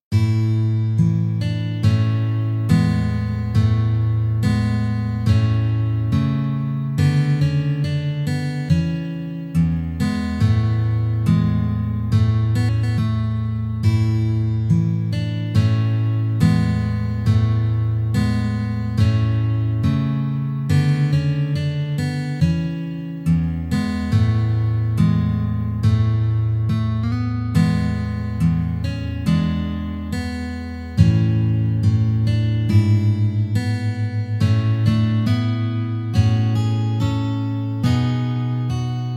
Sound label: Deck The Halls Hard Slow